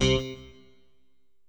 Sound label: funk guitar